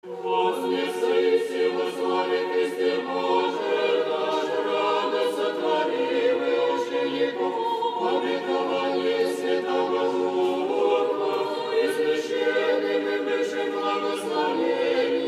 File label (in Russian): хор; Вознесение